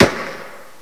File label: mp40 attack; WAR